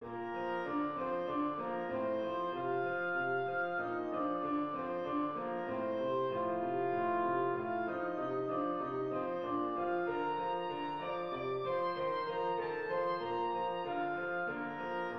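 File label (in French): danse allemande, si bémol majeur; haydn joseph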